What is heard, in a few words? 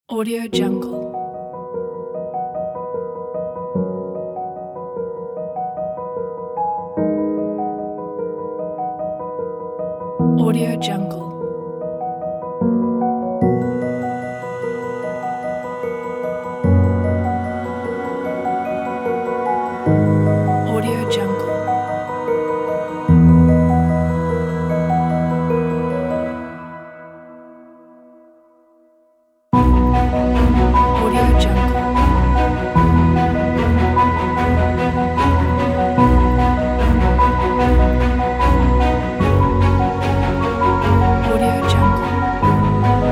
epic sentimental pack